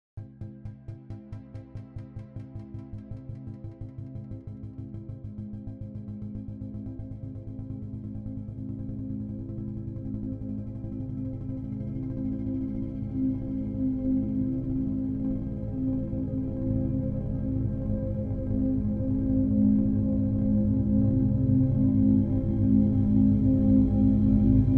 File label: Cello B